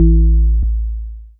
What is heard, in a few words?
BASS